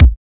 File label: Kicks